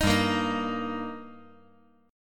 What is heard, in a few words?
G Minor Sharp 5th G, chord